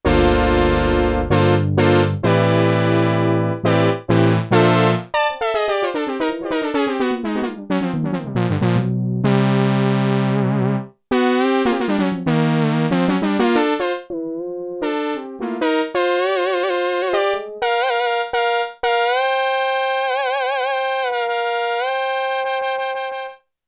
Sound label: AUTO BRASS (4th), Korg DW-8000; Factory Patches